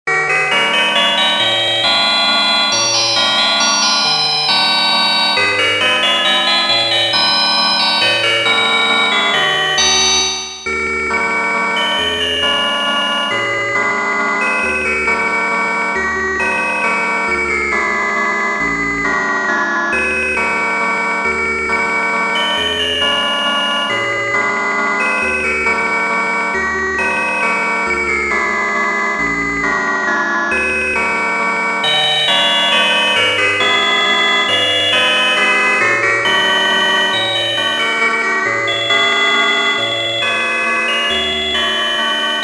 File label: Italy Folk Song; Santa Lucia